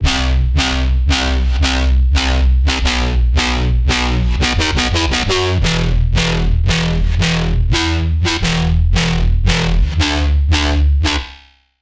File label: DROP BASS CM 172, DNB; KULTURE